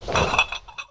Glass Bottles

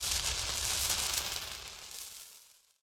Tunnel Blaster Attack

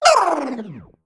female mech, Death

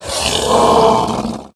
Attack Sound

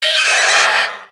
Owl Death

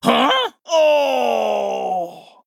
Male Draenei Death

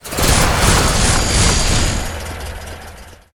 Shredder Attack

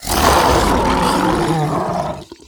Death; Insatiable Felhound

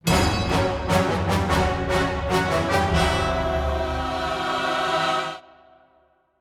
Play Stinger; Talritha

